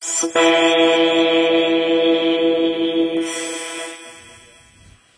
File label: Death; Moai 2